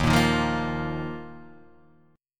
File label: Eb Suspended 2nd; Ebsus2 strummed; JGuitar